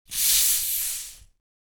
soda fizz, drinks

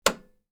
switch

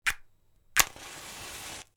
matches; nature

